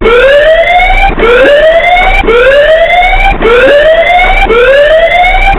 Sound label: alert; cstrike; jb rush